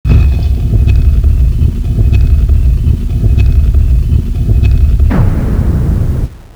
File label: temple door